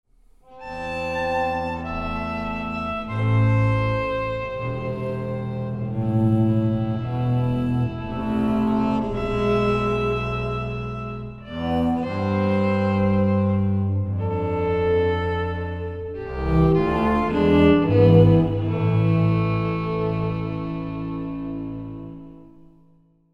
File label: intro instrumental